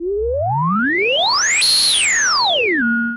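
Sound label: Sample and Hold Filter, SFX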